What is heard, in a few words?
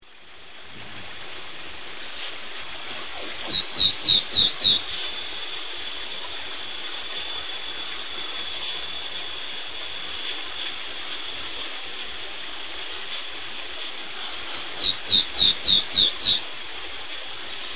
Myrmotherula gularis; SP; Silveira